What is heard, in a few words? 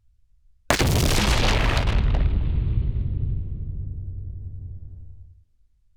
Explosion; GoRecordChallenge